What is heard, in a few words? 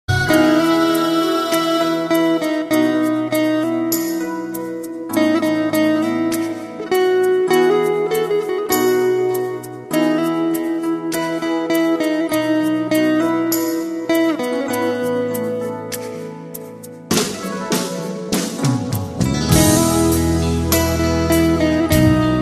Turkish Titanic Mix